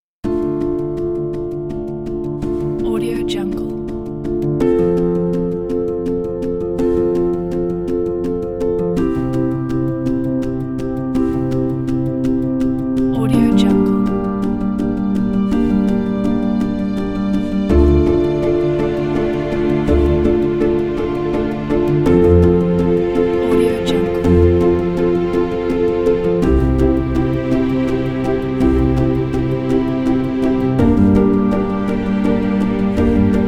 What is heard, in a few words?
romantic peaceful felt piano and strings